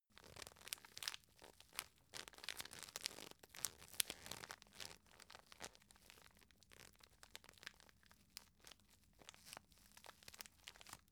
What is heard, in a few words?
tissue package handle